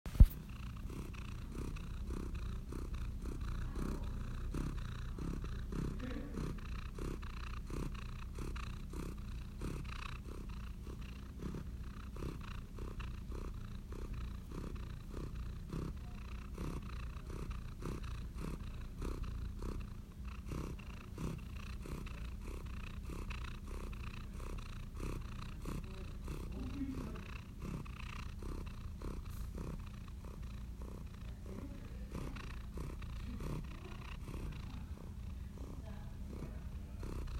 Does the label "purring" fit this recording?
Yes